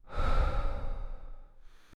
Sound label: studio, sound-effects